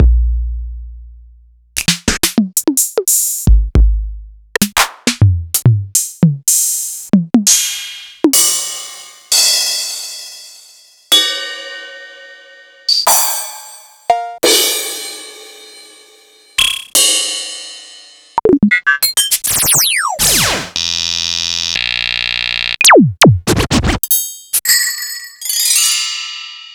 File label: Yamaha RS-7000 Analog Kit